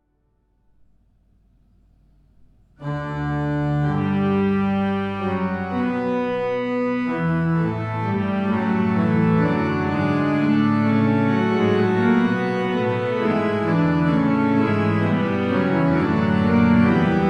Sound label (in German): postludium D Dur, orgeln; deigendesch; oberelchingen